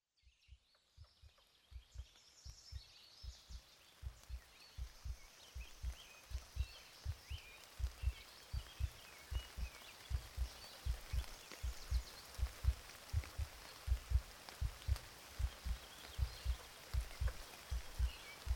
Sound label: Raining Forest